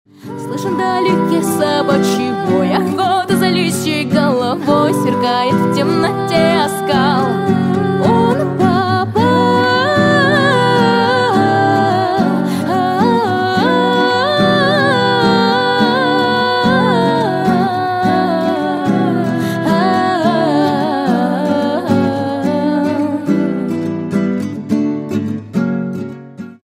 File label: acoustic